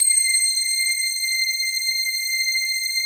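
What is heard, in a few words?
PAD, atmospheric pads; Best Service; XX Large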